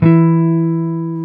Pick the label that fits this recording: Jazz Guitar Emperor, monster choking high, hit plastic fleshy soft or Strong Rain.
Jazz Guitar Emperor